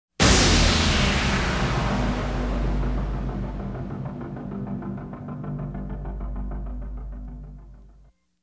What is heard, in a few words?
synth